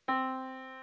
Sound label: Yamaha Disk, piano sound